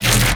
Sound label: granulized, bass